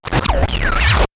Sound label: Course Ripper Zap